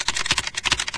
keyboard fast, machines; ambient; Garry's Mod; hl2

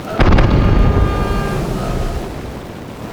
super heavy battleship damaged defend; Hearts of Iron IV Death or Dishonor

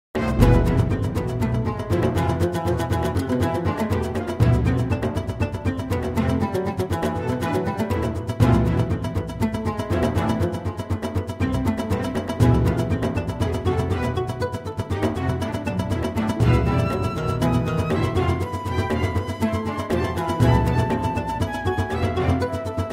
Victory, Soundtrack; Sid Meier's Civilization 4 Complete; Beyond the Sword

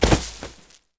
body fall small; Mount&Blade Warband

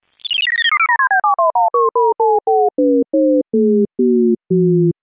lazor off; KSP; Romfarer

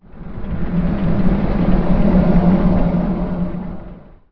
tank motor; Emperor - Battle for Dune

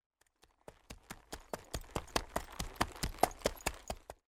Horse; Age of Empires III

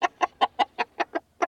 chicken idle; ambient; creatures; Counter-Strike Global Offensive

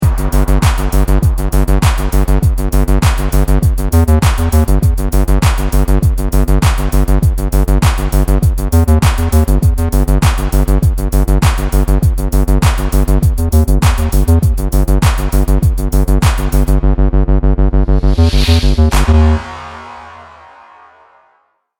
choose team, music; Counter-Strike Global Offensive; hotlinemiami

